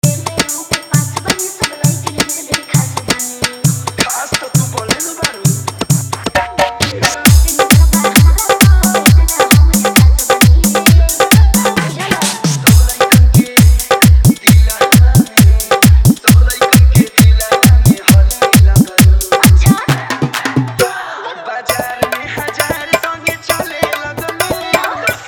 Hard Dhollki Bass Remix

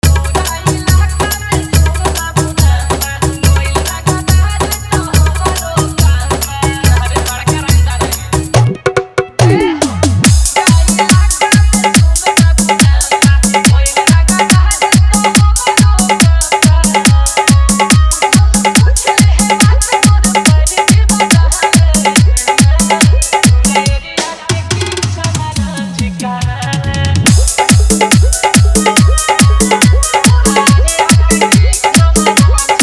Hard Dhollki Bass Dance Mix

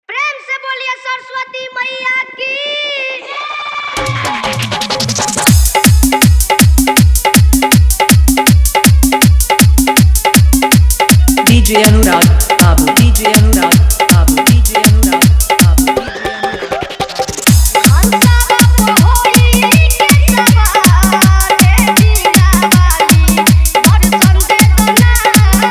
Dhollki Bass Remix

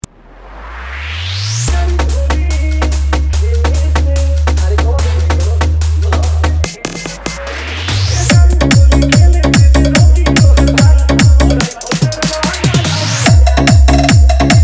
Bhojpuri Holi Song Dance Mix; SMS Remix

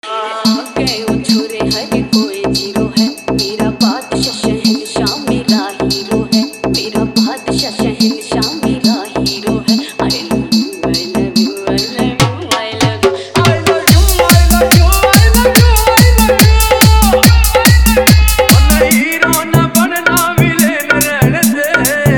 Hariyanvi Hard Bass Mix

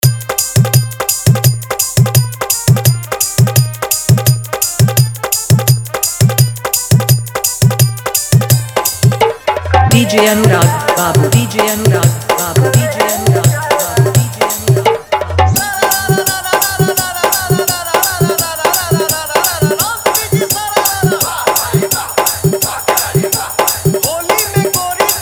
Holi Hard Dhollki Bass Dance

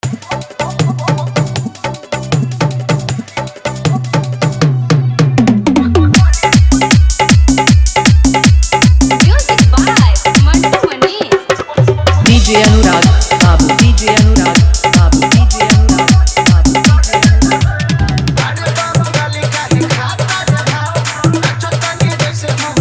Bhojpuri Dj song Fully Dhollki Bass Mix

